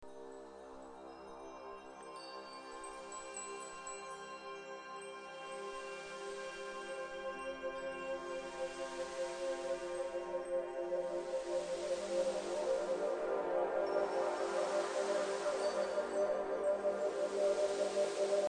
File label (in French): synchronisation ondes cerebrales memoire instantanee